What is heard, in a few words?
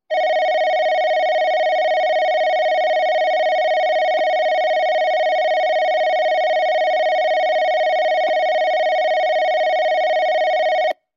bell; jrkyushu; mojist